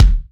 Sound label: punchy kick f key; kick drum samples